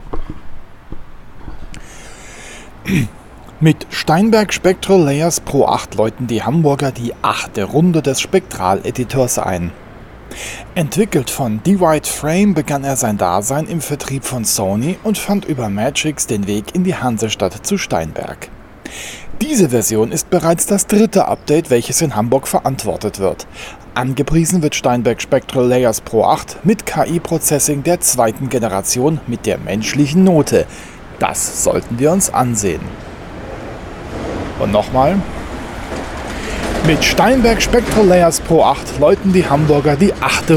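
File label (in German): Straßengeräusch mit Sprecher; Steinberg SpectraLayers Pro 10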